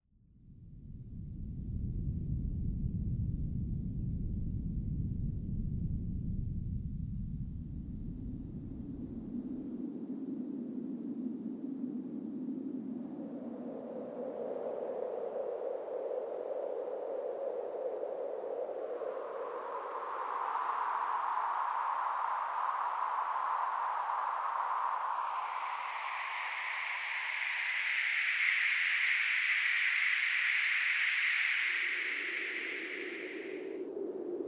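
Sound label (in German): Frequenzbänder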